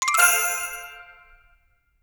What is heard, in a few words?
small win; netent